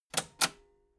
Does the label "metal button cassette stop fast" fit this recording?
Yes